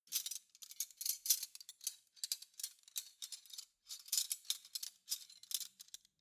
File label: cutlery spoons handle long